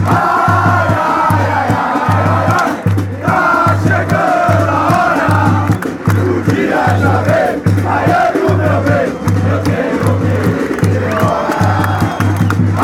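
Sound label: sao paulo chant, football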